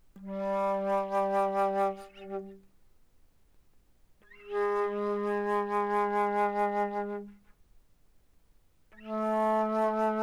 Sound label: Alto Flute ff G3 B3, woodwinds